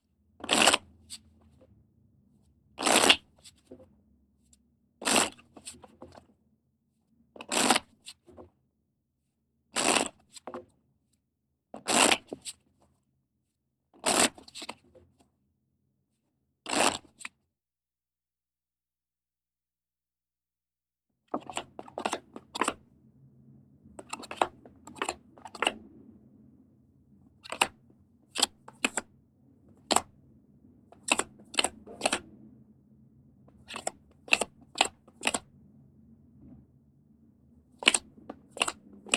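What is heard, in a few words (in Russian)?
playing cards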